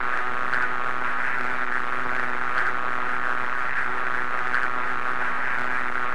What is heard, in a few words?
Aircrafts Cockpits Sidewinder Low Quiet; DCS